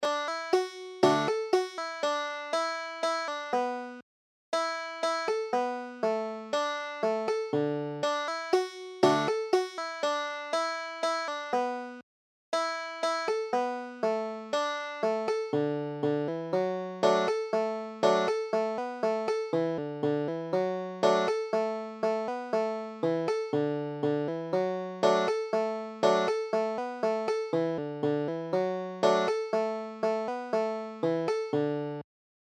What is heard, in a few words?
banjo